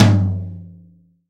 high floor tom sound f sharp key; tom drum samples

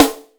noisy snare one shot c sharp key

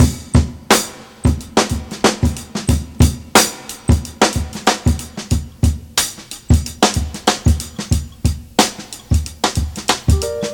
92 bpm fresh breakbeat d sharp key; Samplerate 44100 Hz

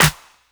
clap sound g sharp key; percussion; single hits one shots

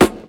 hot hip hop snare drum sound b key; Duration 0:01; Samplerate 44100 Hz

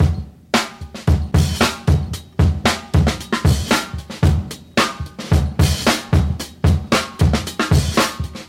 113 bpm drum loop e key

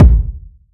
reverb kick drum F key; drum single hits one shots; kick drum samples

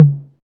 low floor tom drum c sharp key

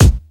sharp bass drum sound a sharp key; kick drum samples

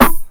sharp acoustic snare f key; snare drum samples

